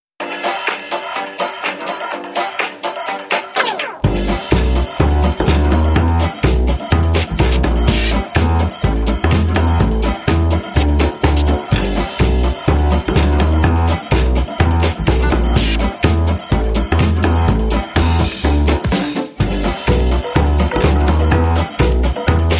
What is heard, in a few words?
No Vocals No Glockenspiel Mix